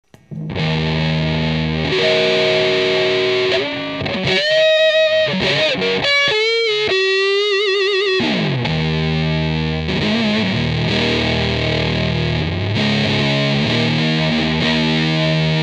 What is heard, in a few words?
Before Mod